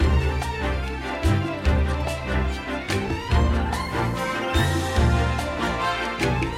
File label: Level Complete Bgm; Scrubby Dubby Saga; Android